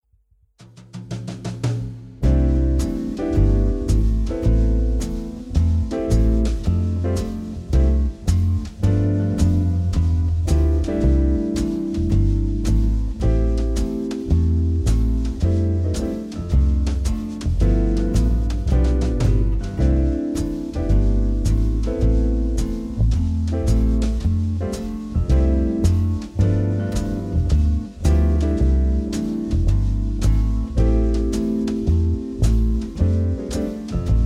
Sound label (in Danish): C uden melodi; akkorder